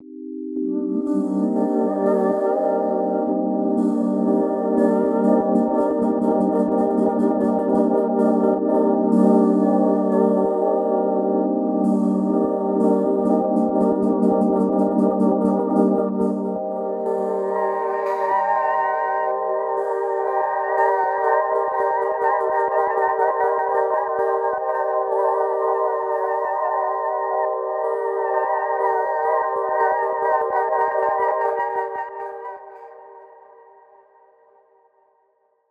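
Motions, sustaining a C minor chord in two octaves; vocal samples; Void & Vista; Kontakt; Designed/Motions/PeppersBoutique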